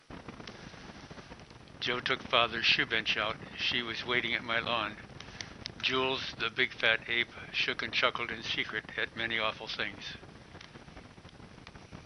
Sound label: hikers story 15mph with triple white cloth and foam; mckaudio